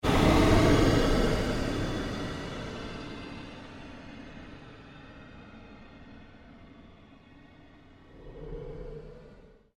Created Pulse Long Fade, Rise And Dive Sound Effects; Sound effects created by manipulating other sounds; Rises and Dives